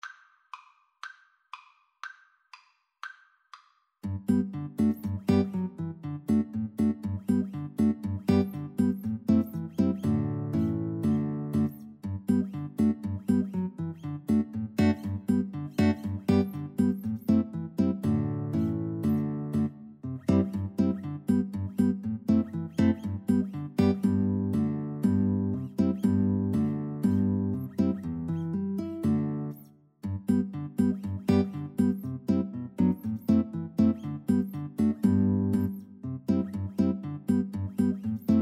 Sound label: Quick March, Violin Guitar Duet